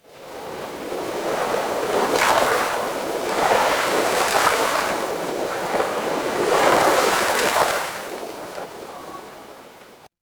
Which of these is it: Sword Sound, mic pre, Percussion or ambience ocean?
ambience ocean